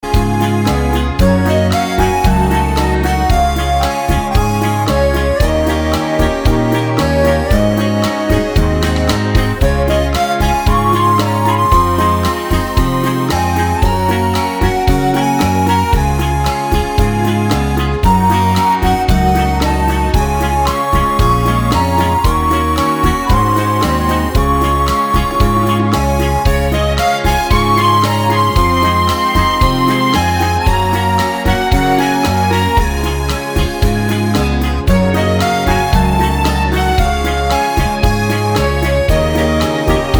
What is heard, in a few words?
mix bachata